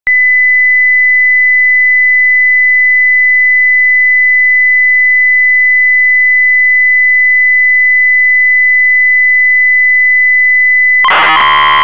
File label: HOMEBREW ONE KEY ORGAN